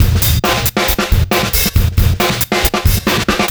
137 Garage Drum Loop; Pro Tools Essential Loops; Dance DJ; Drums; Garage Breaks

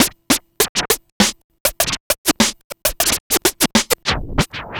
Vinyl Scratch Snare

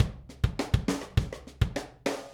102 Rim Grooves, Drums; Pop Rock; Moderate Tempo; Pro Tools Essential Loops; Digidesign

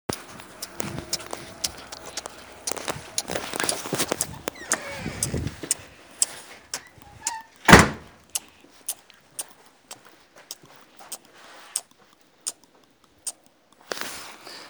new drum beat

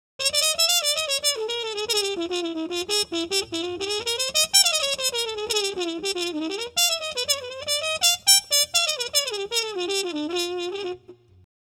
110 Jazz Trumpet _Bbmin; Brass; Pro Tools Essential Loops; Digidesign

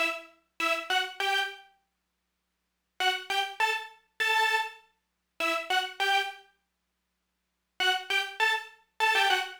HipHop Synth Emin; Digidesign; Pro Tools Essential Loops

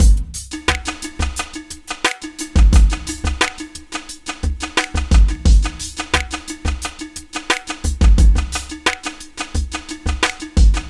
Hip Hop Beat, Drums

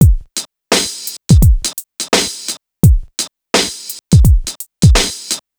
Hip Hop Beat, Drums

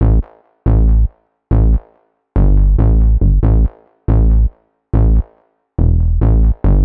140 House Bass Amin; Dance DJ; Digidesign; Pro Tools; Pro Tools Essential Loops

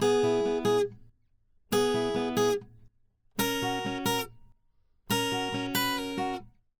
070 Pop Ac-Guitar Arp C#Min; Pop Rock; Acoustic Guitar; Pro Tools Essential Loops; Digidesign

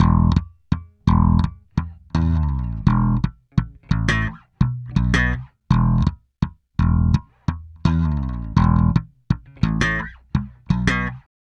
084 Pop E-Bass Emaj; Pop Rock; Pro Tools Essential Loops; Digidesign

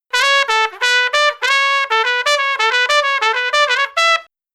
095 Acid Jazz Trumpet _Emaj; Brass; Pro Tools Essential Loops; Digidesign

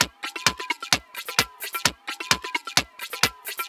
130 Dance Perc Fx; Percussion; Pro Tools Essential Loops; Digidesign

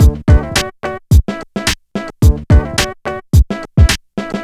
HipHop Break Combo Dmaj; Digidesign; Pro Tools Essential Loops

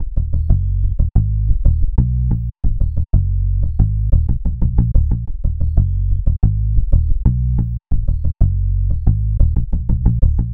HipHop Synthbass D#Min; Digidesign; Pro Tools Essential Loops

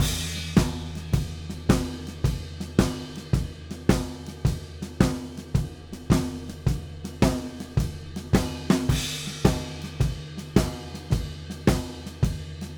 Drums (B Sect), Blues; Digidesign; Pro Tools; Pro Tools Essential Demos; Essential Loop Demos; Demo Session

